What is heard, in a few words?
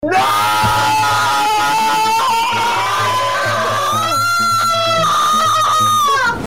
scream